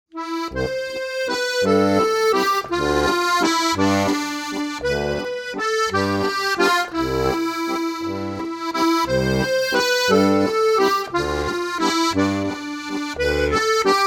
Walzer

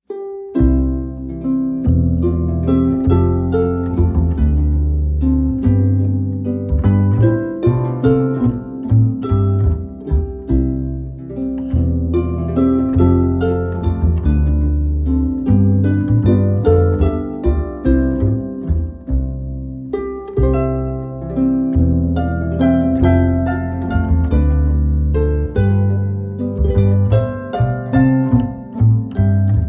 Walzer

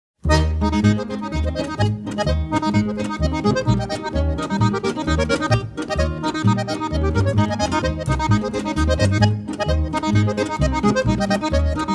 Polka

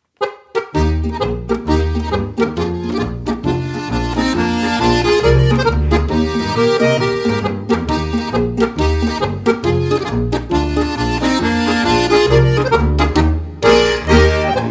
Polka

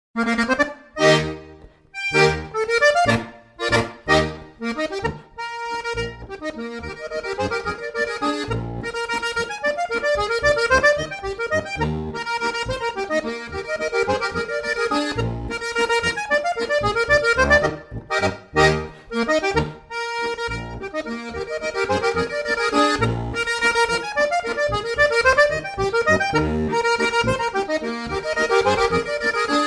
landler

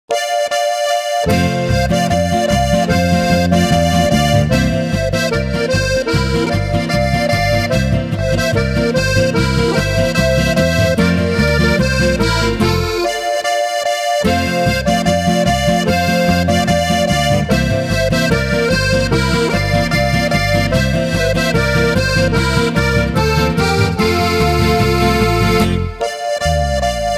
Polka

